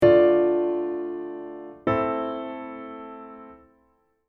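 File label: Recognition of chords; Aural Tests; grade 2; pop vocals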